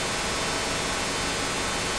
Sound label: sine bark